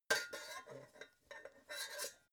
pots clank ring

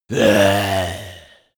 zombie moan idle heavy

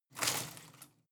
home plant leaves rustle heavy short

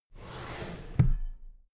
drawer cabinet paper close regular halfway

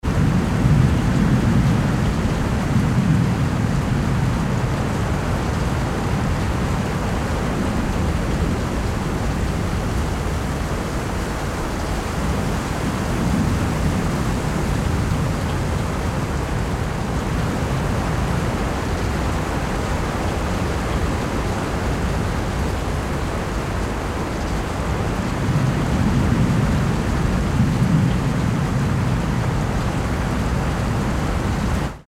rumbling rain ambience

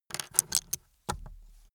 pencil pickup

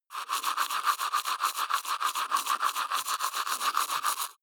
teeth brush wet open molars

